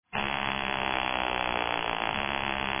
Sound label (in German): Wecker; golden voice